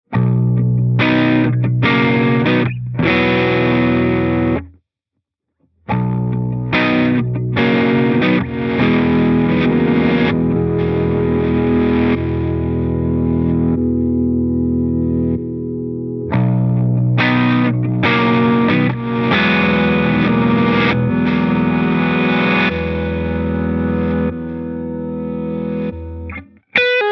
Reverse Triggered Reverse

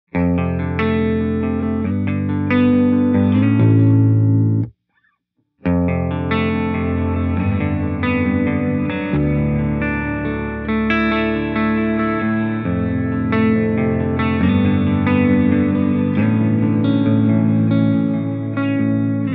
Reverse Decay Baritone, reverb

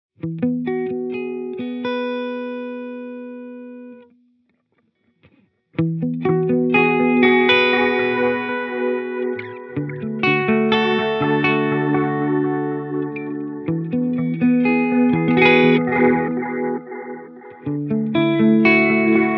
Analog BBD

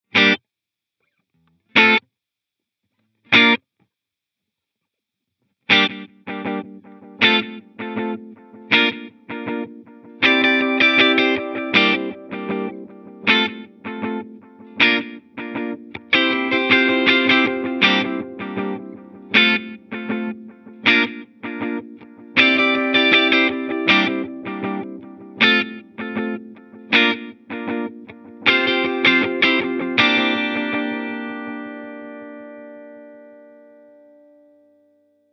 Multi Tone Taps

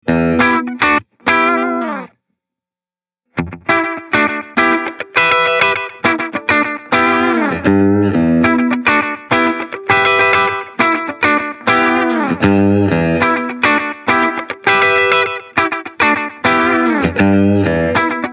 Digital Short Stuff

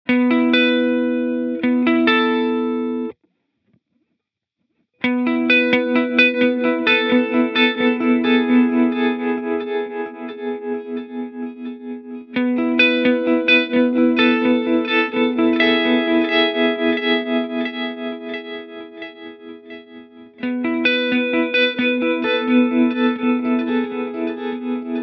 Trem Delay

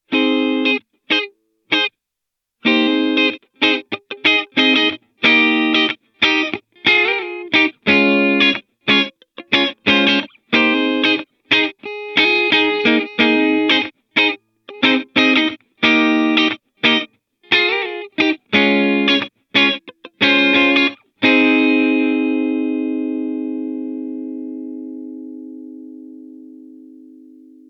Gated Reverb Funky

